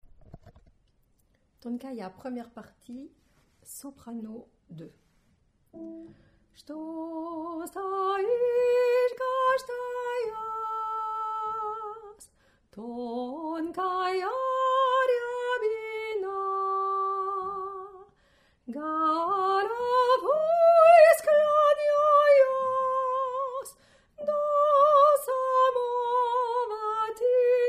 Soprano

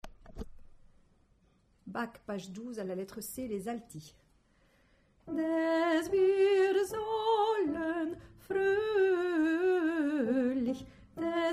Alto

